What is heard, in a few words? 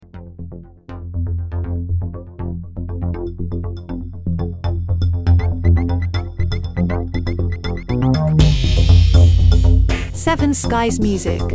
electro - enigmatic